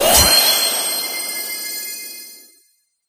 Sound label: Float; Life of a Naomi